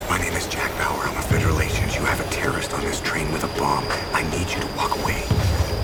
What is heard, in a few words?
trainbomb